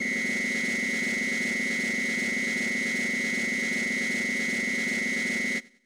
Champion Whistle

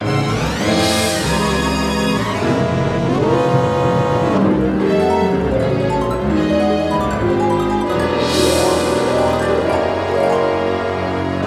Shooting Star Jingle; captain rainbow; wii

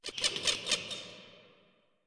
Ambience creature

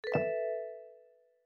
Bomb Awake; my cute commissar 2020 gamerip

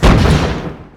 Big Gun

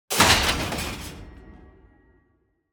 inmate wheelchair destroy; Devour

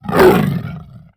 Leopard Attack

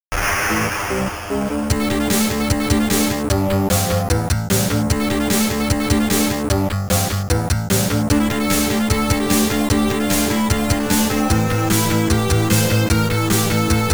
Stage BGM Amiga; Street Fighter II; U.S. Gold collection

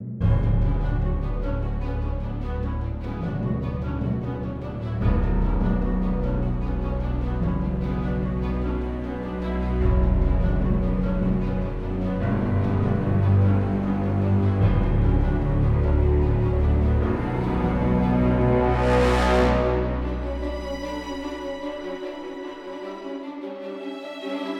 Starfighter Music; Galatic Starfighter

